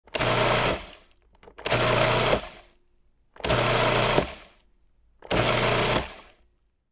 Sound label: MIXER FOOD PROCESSOR